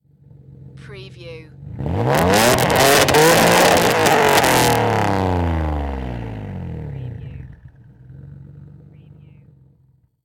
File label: NISSAN 350Z EXHAUST MULTIPLE REV